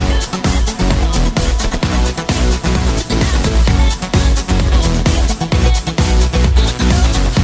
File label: excit